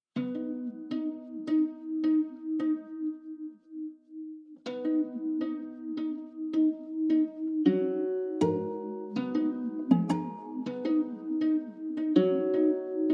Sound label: harp; emigre and exile; I. mother harp